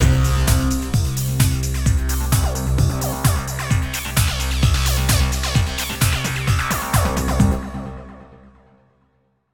Signature Sound; FXHome; Signature Tracks

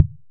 impact Wood heavy; Kenney's Sound Pack; Impact Sounds

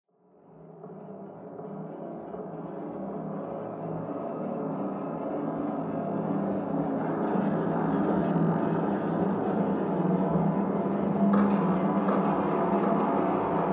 Atmosphere; 99Sounds; InterSpace

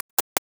Perc, Data Mosh Hits; Other Perc; 99Sounds; Mangling Audio

